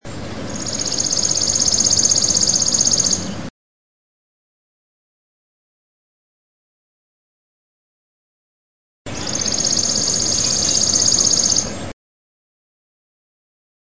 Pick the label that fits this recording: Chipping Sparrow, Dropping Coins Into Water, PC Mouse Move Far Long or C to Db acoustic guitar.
Chipping Sparrow